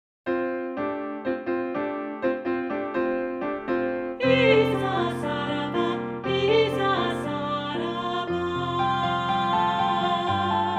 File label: Snow Haiku vocal